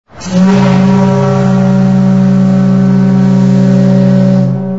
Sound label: whistle